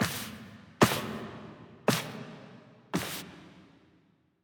Fight Intro Foley; Cinematics

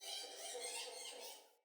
alley critter; Ambience; Under City

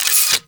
Fortress Mine Retract; Warframe; Ambience; Grineer Fortress

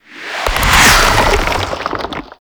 Sand Spawn; Warframe; ManInTheWall

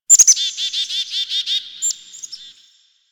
Bird Chickadee; Warframe; Ambience; Nineteen Ninety Nine

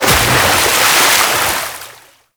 Pirate Undertow Splash; Warframe

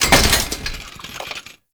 Cash Register Destroy; Warframe; Ambience; NineteenNinetyNine

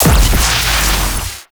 Guardian Shield Shutdown; Warframe; Eximus

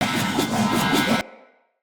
Great Sword Throw Perfect Loop; Weapons; Player; Swords; Mendicant Great Sword

